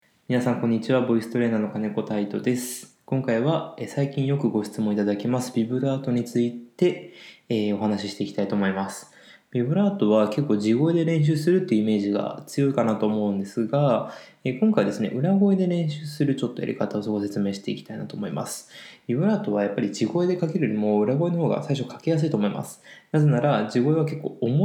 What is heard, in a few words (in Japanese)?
ビブ